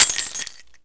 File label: break bottle